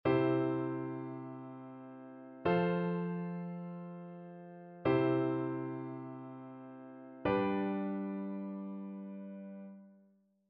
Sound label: harmonic dictation; aural skills i